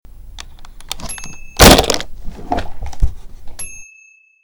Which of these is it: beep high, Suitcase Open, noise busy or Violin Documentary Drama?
Suitcase Open